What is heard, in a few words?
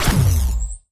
SHOCK RIFLE BEAM FIRE; rifles